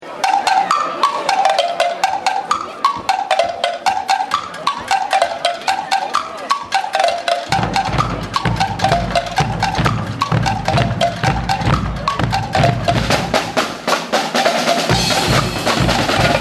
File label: Samba